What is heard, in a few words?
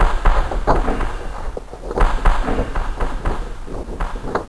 freight move